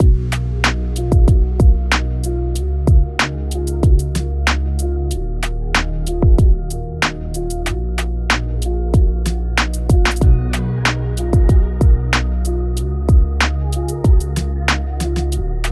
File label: E♭ minor 94bpm